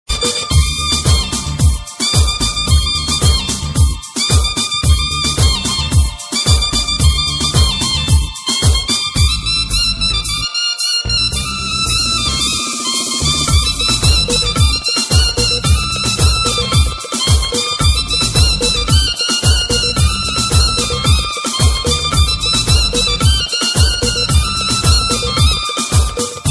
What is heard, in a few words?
remix ringtone